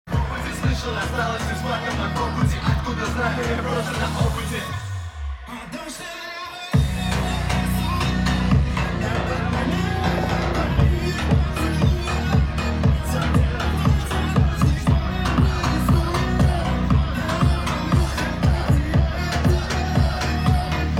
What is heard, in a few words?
funk remix